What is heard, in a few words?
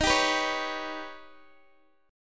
D sharp Augmented 7th D sharp Guitar DADGAD